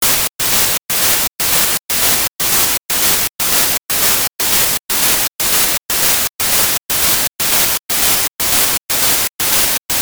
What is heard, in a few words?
MEANDR PHASE90